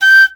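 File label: piccolo staccato G5; Flutes; DirectWave; Varazdin Orchestral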